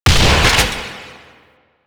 Shot, Weapons; Corvas Prime

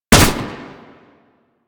Auto Crossbow Shot; Warframe; Tenno

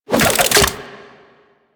Rifle Reload; Grn Kuva Lich Rifle

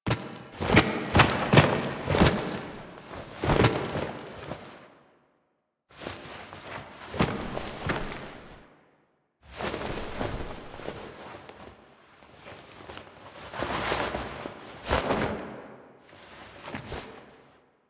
Intro Foley; Archon Death; Drifter; Warframe; The New War

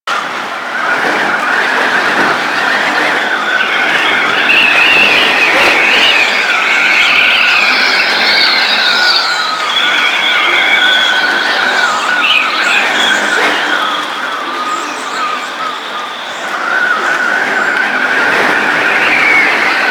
Wind Through Rocks Loop, Mountain Pass; Ambience; Warframe; The War Within

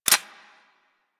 M16 Mech End, rifle; Wraith

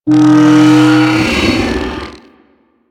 Arachnoid Camper Step Movement; Warframe; Corpus

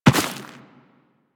Run Dirt, Footsteps; Warframe; Teshin

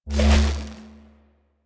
Fishing Spear Charge; Warframe; Venus Fishing Spears

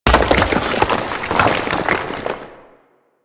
Large Rock Crumble B; Ambience; Warframe; Grineer

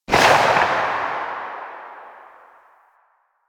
Epitaph Prime Tail C, Weapons Sidearm; Warframe; Tenno; Wraith Sidearm

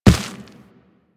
Run Dirt, Footsteps; Warframe; Operator

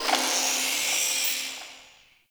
Mid Bird; Ambience

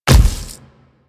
Infested Splitter Step Default, footsteps; Warframe; Grey Strain

